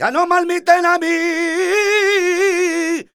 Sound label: voc c, Flamenco Vocals Male Cantaor